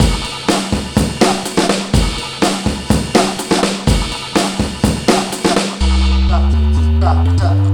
DRM 124, Breakbeat; Best Service ProSamples vol.24; AKAI

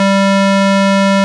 ORG Farfisa; Roland LCDP09 Keys of the 60s and 70s 1; Farfisa Clar

